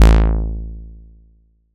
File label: sharp acid 303 bass stab; 2 seconds